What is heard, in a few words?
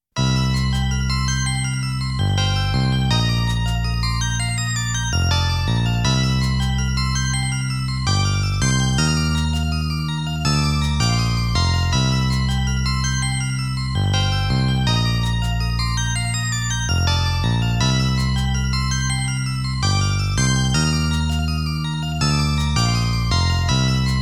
Ranking BGM